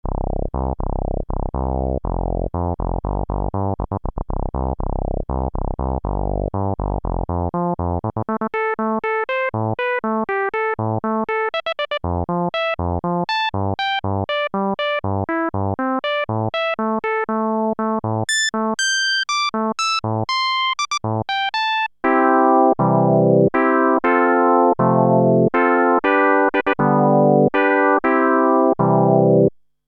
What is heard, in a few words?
e-mu morpheus filter demo lpf